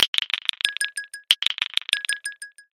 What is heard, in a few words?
nokia cubes